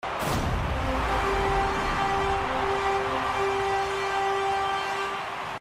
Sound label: rocket league goal sound